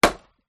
industrial axe chop strike wood single; zapsplat

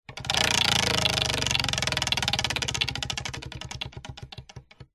leisure wheel of fortune wooden spin slow

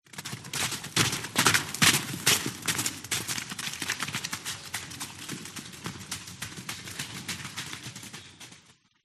Zebra; Audio Hero

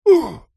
human male vocalisation pain uhhh; zapsplat

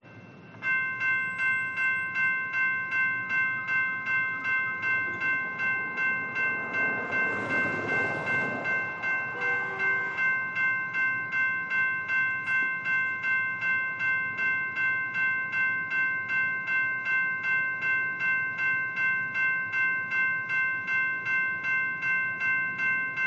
Railroad Crossing Bell & Train Pass; AMTRAK, BSNF

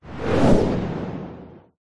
whoosh; Audio Mangler

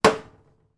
household glass put down on metal draining board

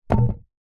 cd disc drop carpet; household

